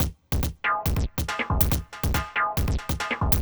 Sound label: Drums Strange